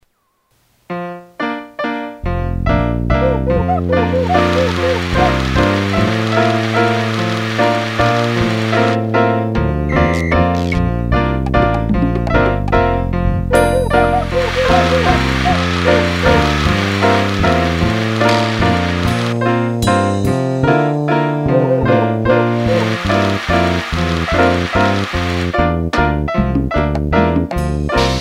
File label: Waltz Applause